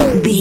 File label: retro impact hit